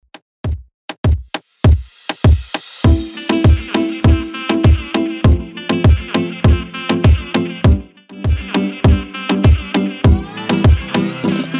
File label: Moombah Rton 100bpm; Top40